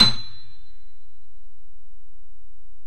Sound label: Steinway, ff; Roland L-CD701; Steinway M